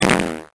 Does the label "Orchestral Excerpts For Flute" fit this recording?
No